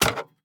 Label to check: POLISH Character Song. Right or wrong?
Wrong